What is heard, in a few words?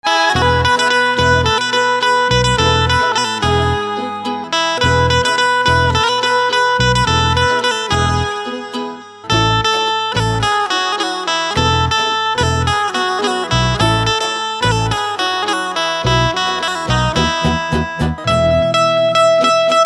Banjo Instrumental Ringtone